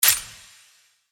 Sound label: click